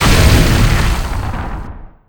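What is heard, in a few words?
Laser Hit Interior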